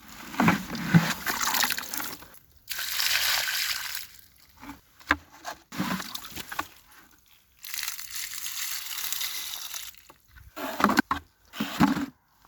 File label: Water Pouring Out Of Bucket